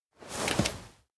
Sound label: dismount bike; hiromi; anemoi